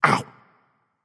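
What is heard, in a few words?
non verbal ouch, voice; cernunnos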